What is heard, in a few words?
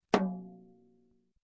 Roto Tom Low Hit